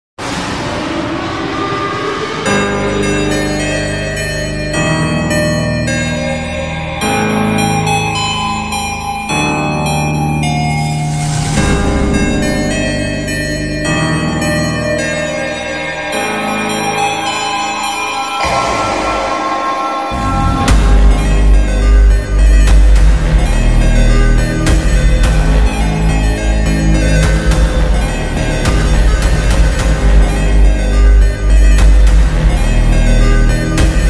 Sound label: Ultra Slowed, Phonk